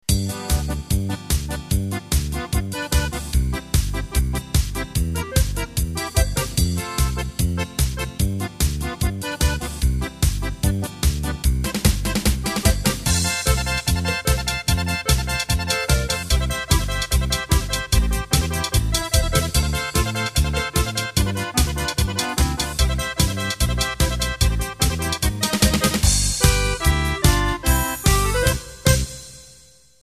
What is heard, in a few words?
Polka Bavarian